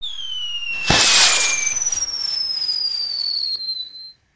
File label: Concert Firework Layer